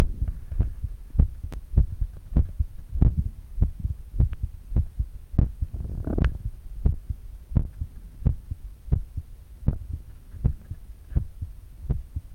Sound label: heart